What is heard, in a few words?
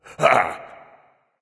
surprise; smite; Vulcan